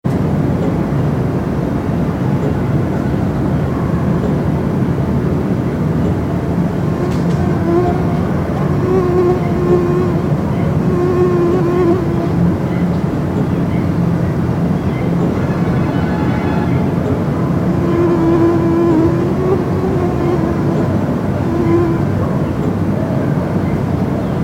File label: new delhi mosquito theme